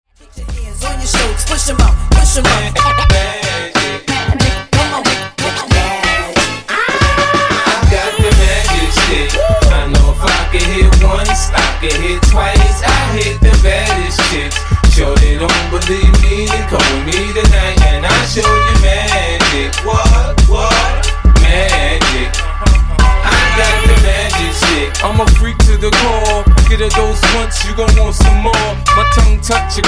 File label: Transition, 92 127Bpm